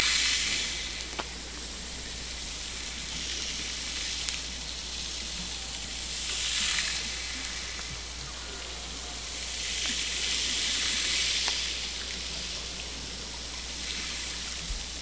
Hydrophones, orcasound

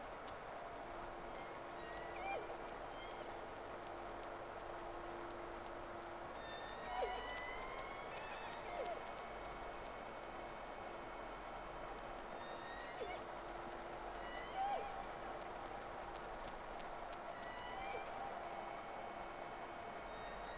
Whales; SeaSnake

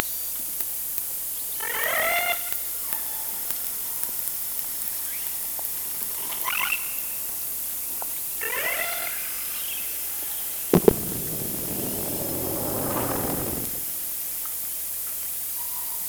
Orca sound Night Passby

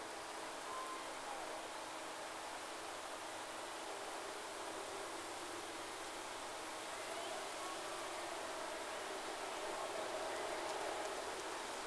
Whales; SeaSnake

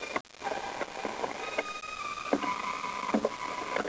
Sea Sounds, Calls

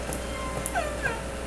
call S7 J version; Sea Sounds

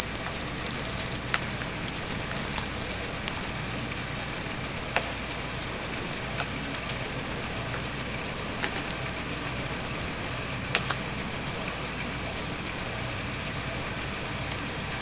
Hydrophones, orcasound

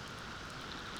Background Noise

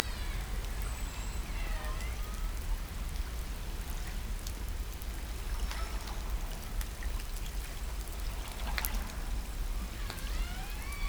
hydrophones, lime kiln

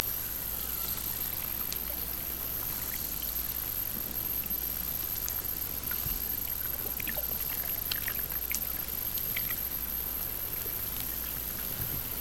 Hydrophones, orcasound

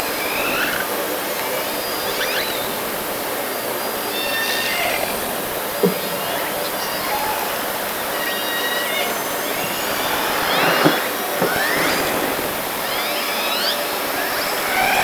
Orca sound Night Passby

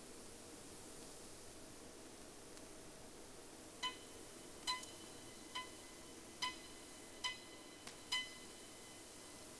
sound, Boat; SeaSnake

